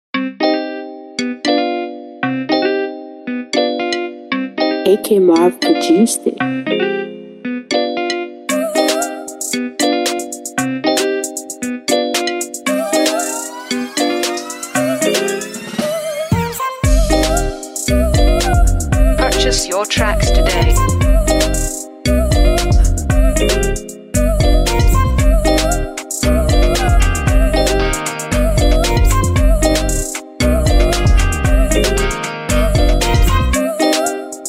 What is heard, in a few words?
Afro Swing Instrumental